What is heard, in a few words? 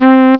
SAX